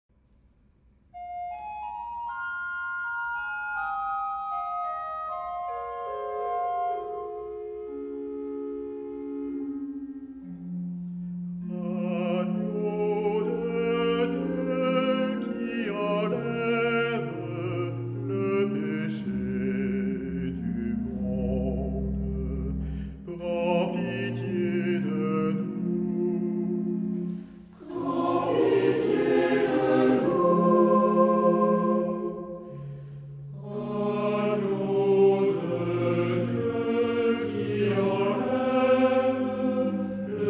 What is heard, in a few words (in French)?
agneau dieu misericorde chorale